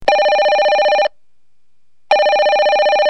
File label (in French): Téléphone